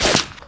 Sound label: silenced 22 pistol; weapons; pistol 22mm